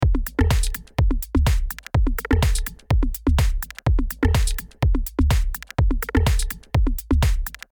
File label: Loop 1 and 2 processed mix